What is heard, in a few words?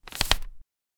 a hard hand grabs paper